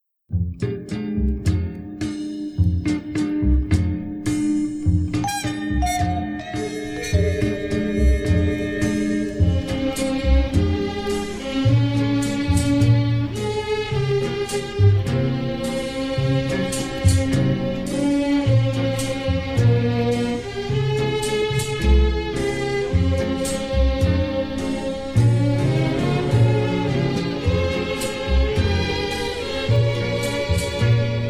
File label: strings with brass; the ipcress file